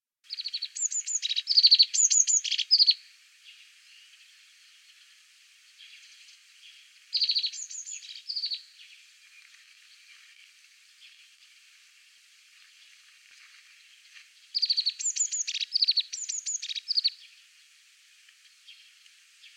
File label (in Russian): belaya lazorevka golos